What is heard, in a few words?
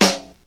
snare single hit c sharp key; snare drum samples